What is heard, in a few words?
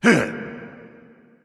grunt; smite; sobek